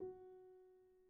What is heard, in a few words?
F#4, Grand Piano; bitKlavier Grand; Piano Bar